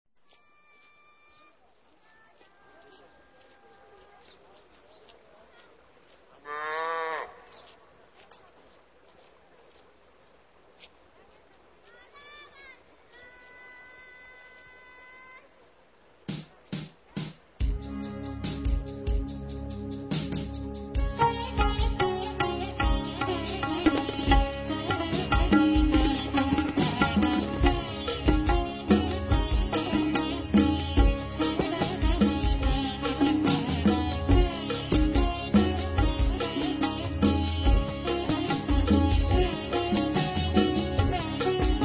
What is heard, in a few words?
Fusion Music Raga; RKC Live Stream; Travel India